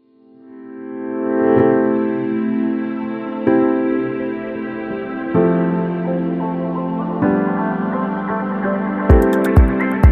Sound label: Business Background